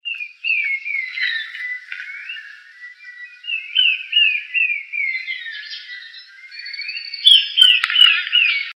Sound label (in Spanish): chinchero